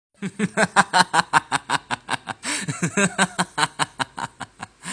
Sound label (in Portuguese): risada